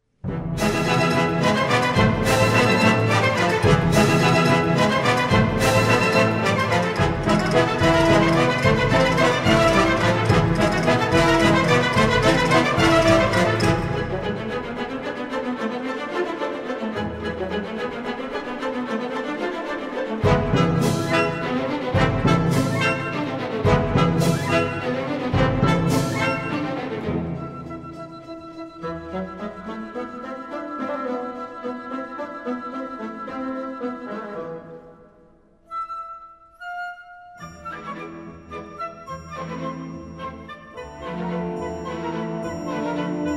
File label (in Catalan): Orquestra; El Escorial